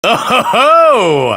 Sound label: ohoho